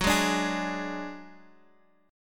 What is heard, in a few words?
Gb Suspended 2nd Sharp 5th Guitar 4F,4A,5C,5G,5C,6E; strummed